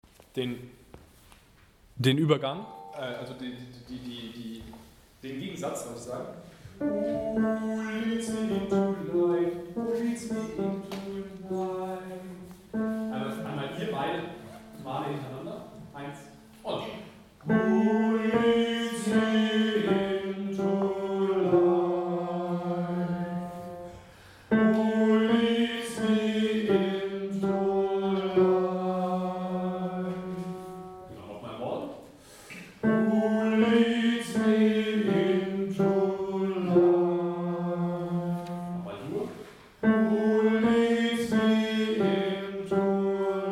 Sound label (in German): Bless the Lord Tenor Schluss